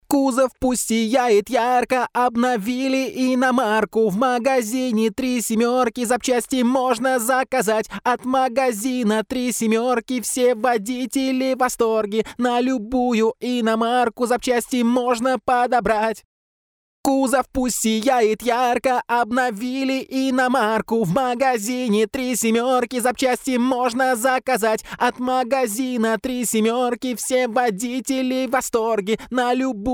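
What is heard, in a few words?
vocal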